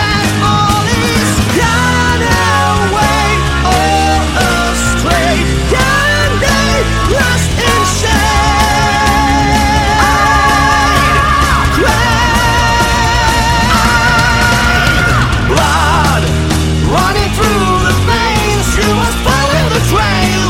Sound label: A Flat Chromatic